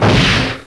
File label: Gun Shot; CHECKERMANIA 1.04